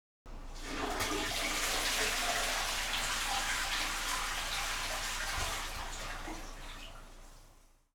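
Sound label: toiret, toilet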